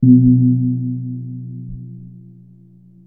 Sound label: kempur, gamelan; gravityharps